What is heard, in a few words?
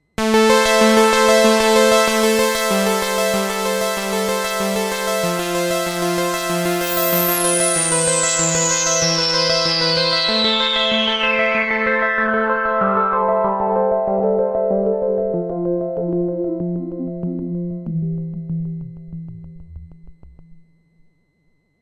JU-06A Arpeggio Filtersweep